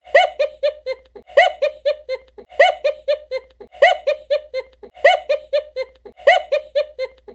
risada

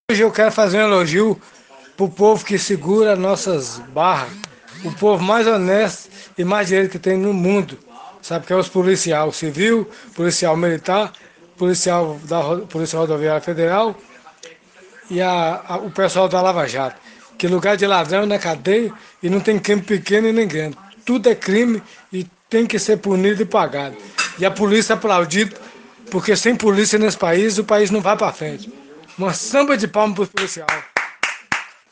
samba de palmas; pros policial

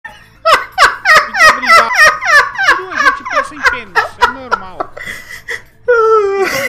risada

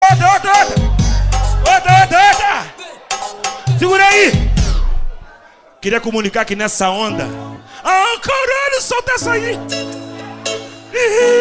ao vivo no projeto funk carioca

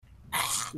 dragao rugido; kirin

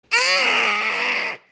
grito monstro

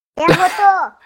arroto

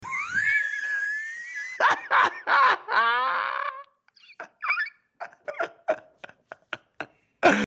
risada

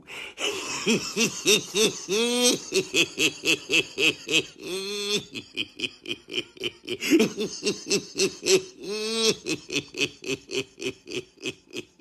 risada alucinado; Jose de Abreu

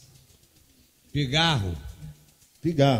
pigarro; alborghetti

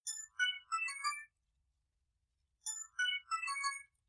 toque celular; steven universo

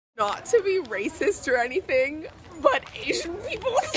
risada de ganso

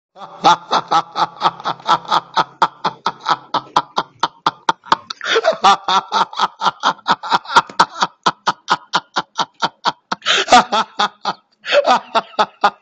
risada

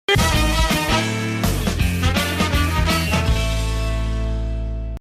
vinheta

